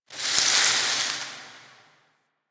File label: Tower Branch Disturbance; Warframe; Quests; TheNewWar; Ambience; Cetus; Unum Tower